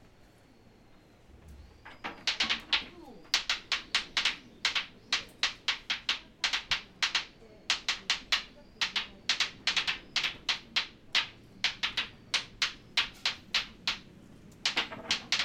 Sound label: wooden balls; miyajima